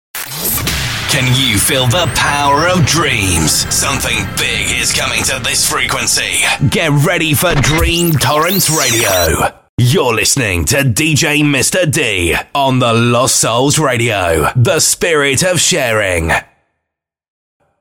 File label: jingle